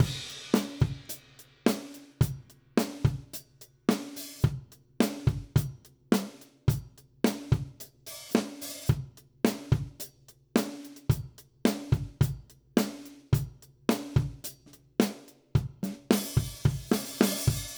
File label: Country Drums Chorus; Digidesign; Pro Tools; Pro Tools Essential Demos; Essential Loop Demos; Demo Session - Country